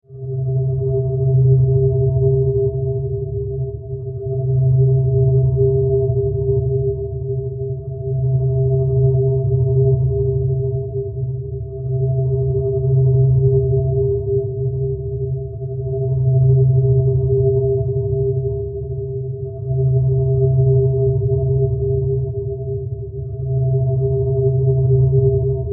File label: Science Fiction Drone Pulsating Alarm Slow; Sci-Fi